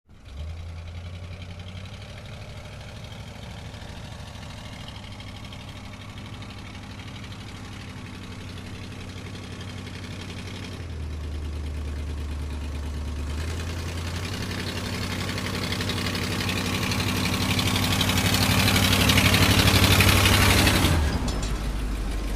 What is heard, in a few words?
Truck Slow Stop Off